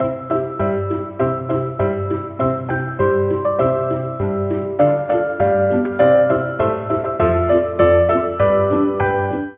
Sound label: ringer; Ringtones